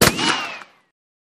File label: Bullet Ricco Single